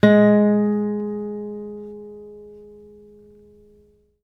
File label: ACC FG G#2, ACOUSTIC, Guitar; East West; Steve Stevens Guitar Samples Collection